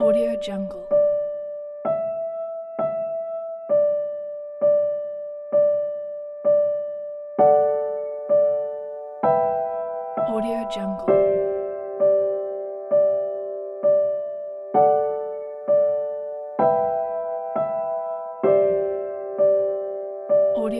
sentimental

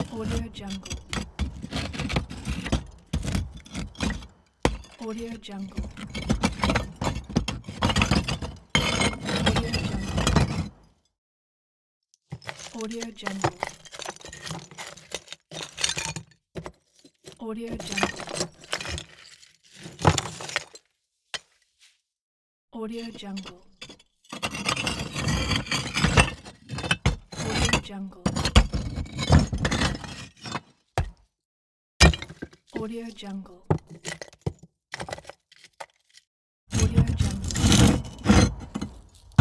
debris movement

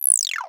screens; soundmorph

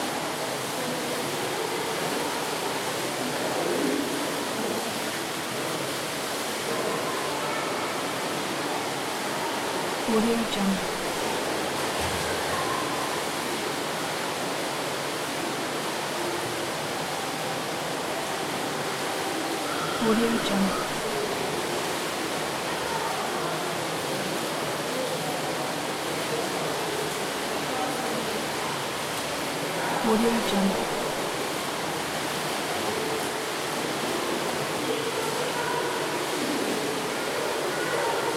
ambiance swimming pool indoor loop

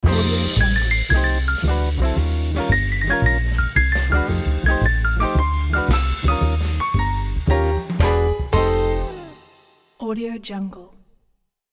jazz cats logo; drtimwaters

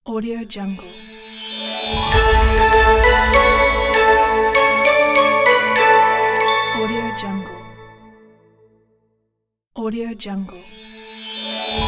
christmas logo opener; terrasseproduction

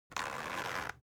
foley zipper soft

